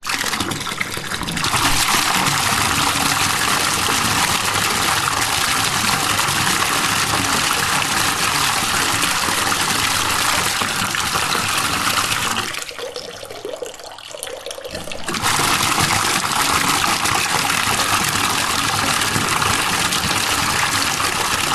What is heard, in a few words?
bathtub water on run alternate taps off already half full; household